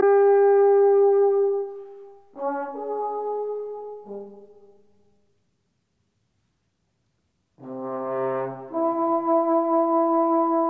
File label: Bass Trombone Bass Clarinet Harp